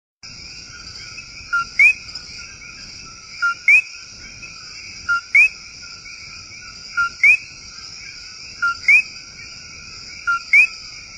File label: morning hen, animals